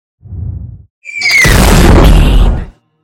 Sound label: scifi whoosh hit